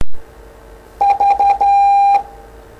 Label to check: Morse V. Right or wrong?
Right